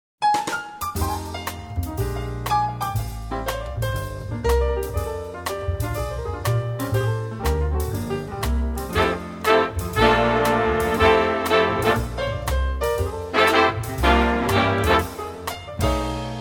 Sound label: Swinging Xmas